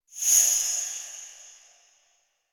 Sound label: Cymbal Metallic Whoosh By Thin And Fast High Pitch; Hollywood Edge; sound designer's toolkit v2